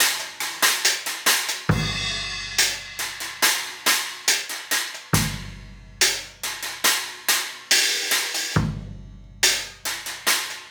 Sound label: Cymbal and Kick